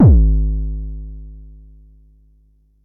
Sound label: Bassdrum, Jomox Xbase09